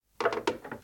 Wood

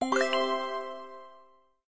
Pass Reward Gain; hello kitty friends match; android

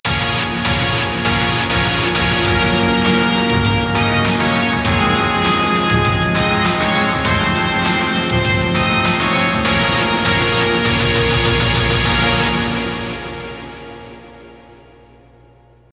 Str G#

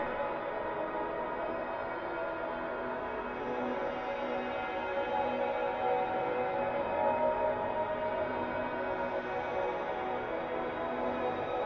Amb Bagpipe Loop; viewfinder

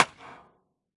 Sfx Baseball

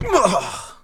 Male Hurt; Mars Sortie

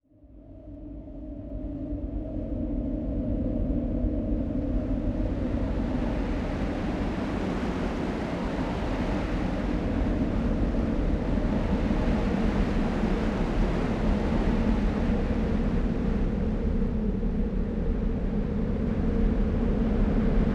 Ambience

